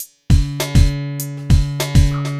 drloop, drumloops, Vinyl Attack; Masterbits

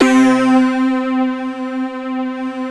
ARP, Analog Fantasies; USB Soundscan vol.49; AKAI

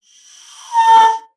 BASSOON; Xsample VOL-6

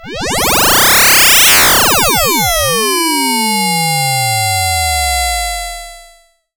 SYNTH FX

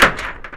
clix machine; Rarefaction; Poke in The Ear With A Sharp Stick

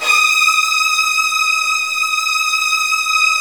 Virtuoso Strings

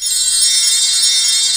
WINDER C5, Synth City

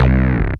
DM BAS, Dance Mega Synth

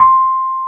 AMB ROAD, Keyboards Old School

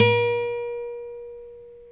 GTR NYLON, Nylon Chorus; Roland LCDP02 Guitar and Bass; Nylon String

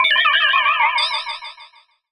CRYSTAL, SCI FI; AKAI Anime Sound FX

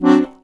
HOHNER, akkordeon; Best Service; Alpine Volksmusik

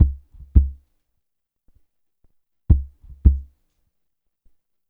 SWT WAVEDRM2; Spectrasonics

